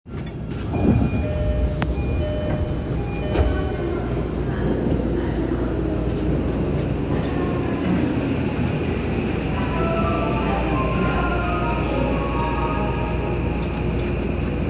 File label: train sounds; 南北線駒込北